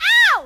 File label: ow